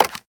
pickup; soldiersfury

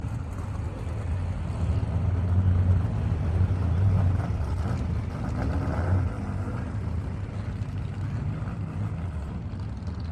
ambience garage; lightning speed

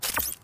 omnitrix open; ben 10 steam camp

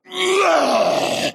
block push; star-wars-rebels-team-tactis

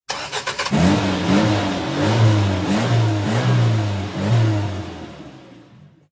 car start; Road-Fight

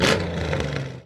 ui btn enter engine booth; lightning speed

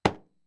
object hit cone; lightning speed

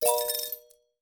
won credits; Casualino; VideoPoker

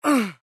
jump; star wars rebels team tactis; ezra

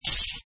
chips win; inlogic-blackjackmaster

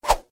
jump; ninjarun

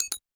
card hold; Casualino; VideoPoker

